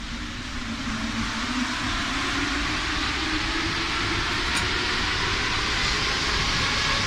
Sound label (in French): acceleration, Metro